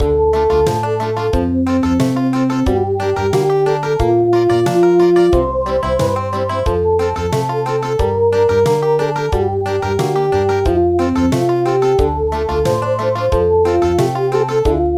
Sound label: Country; traditional; So calm down good child